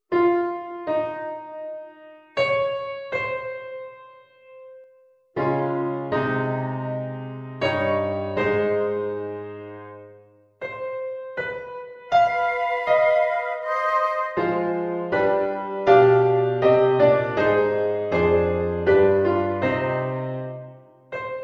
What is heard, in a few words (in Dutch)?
vierstemmige zetting